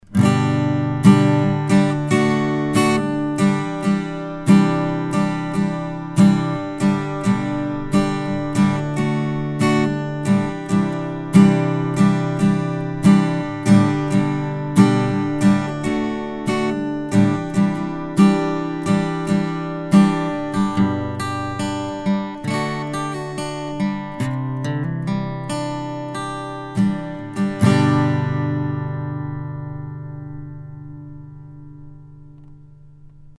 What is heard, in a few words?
Acoustic Guitar; mic shootout